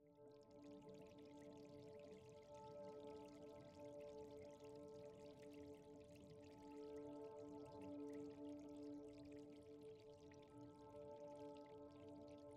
sfx

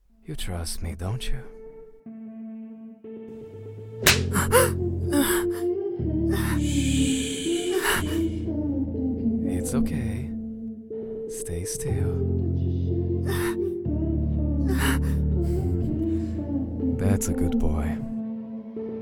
whiplash

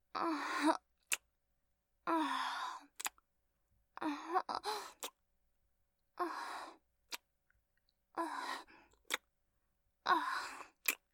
lick

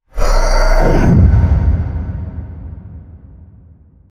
Player Death

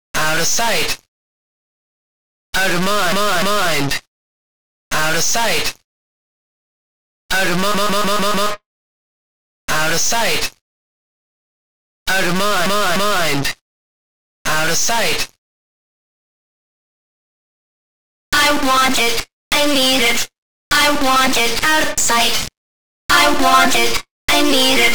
overdrive 201.3bpm

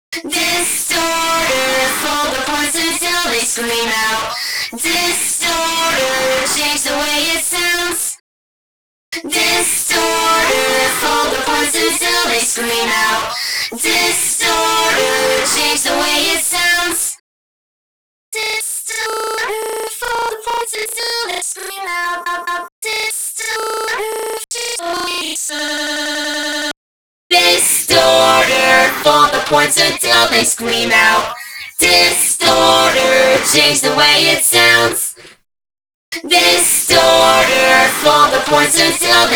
distorter 213.3bpm

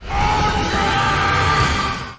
PUNCH; ALL MIGHT

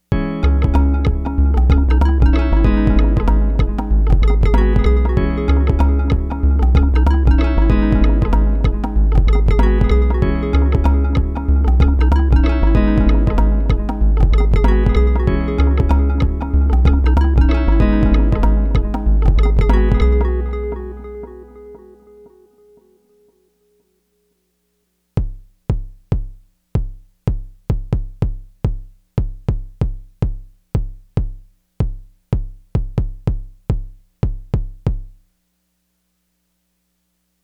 95bpm

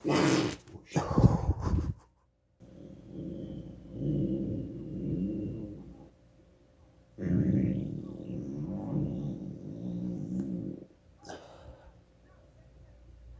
mouth farts

